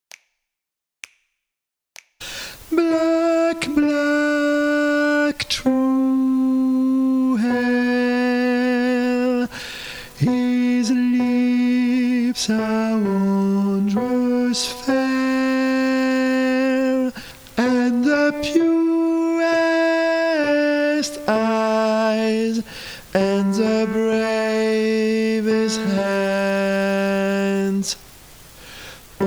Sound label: black is the colour, tenor